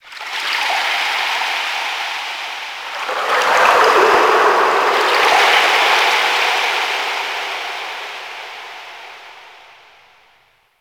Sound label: Ocean Underwater Texture Small; Ambience; Warframe; Grineer Ocean